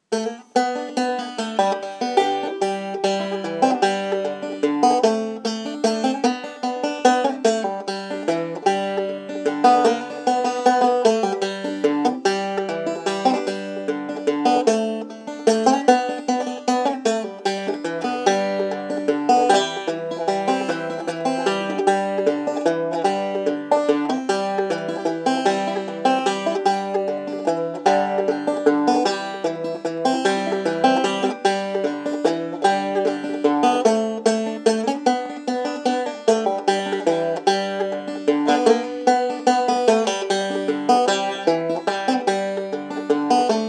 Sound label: cowboy waltz